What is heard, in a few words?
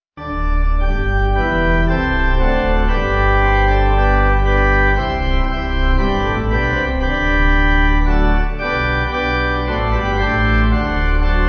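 Pipe Organ; Bendigedig Fyddo; Mawlgan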